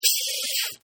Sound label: Spraycan, Spraycan Spraying